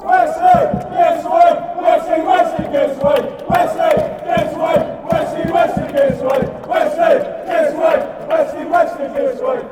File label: give us wave, fanchants; westley